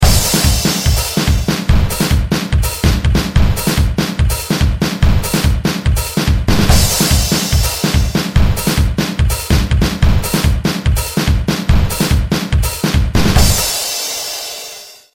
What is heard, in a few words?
BEAT Moombahton; Rhythm Core Alpha 2